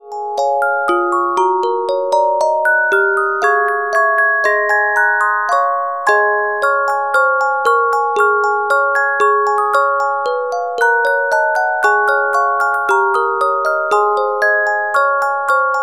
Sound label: Carillon Music Box Melodies